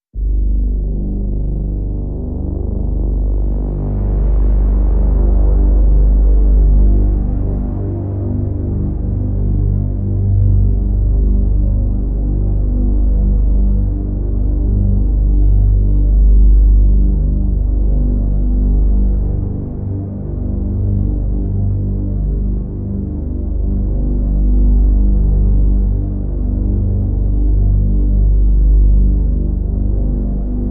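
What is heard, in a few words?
Drn G, drone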